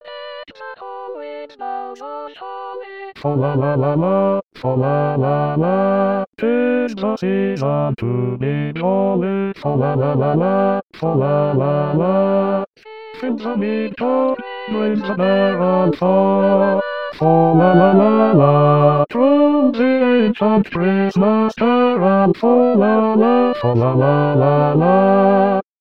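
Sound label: Deck the hall Bass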